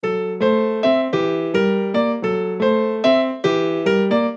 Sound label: 110 NuJazz Piano Dmaj, Grand Piano; Digidesign; Pro Tools Essential Loops